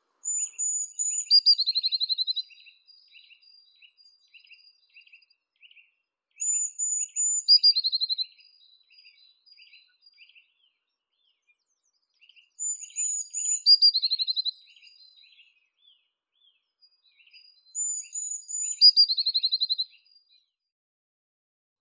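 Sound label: blue tit, Cyanistes caeruleus; lazorevka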